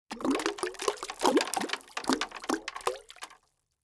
Liquid Water Marble Dropping Into Water In Plastic Tub